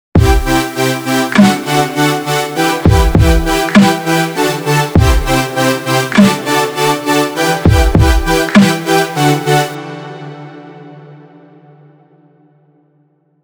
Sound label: with Drums